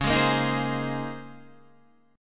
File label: A sharp Minor 11th